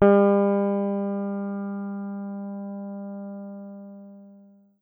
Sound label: Guitar